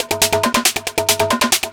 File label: drm 138, Techno, drum-loops; Techno ID; franchise-loop